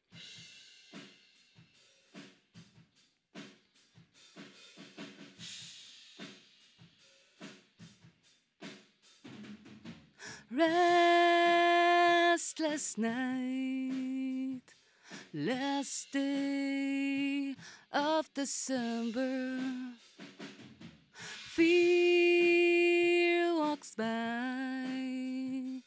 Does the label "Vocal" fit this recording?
Yes